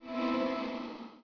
connection lost, phonetones Nokia N900

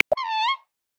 Black Swan, Australia; Xiaomi Redmi-Note-8-Pro

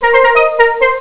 Jamaica, Cisco Unified IP Phone 7945G

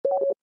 Low Battery

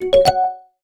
Overtone, notifications; Realme 8

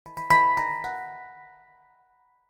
Hang drum, notifications; Samsung Galaxy Note 3

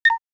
Camera Timer; Samsung SGH-A401

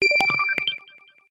Glow, notifications; ZTE Grand-S-2

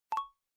Unlock, phone ui; Gionee; S10

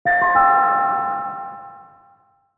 Noise; ROKiT IO 3D

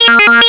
Ring, Cisco Unified IP Phone 7945G

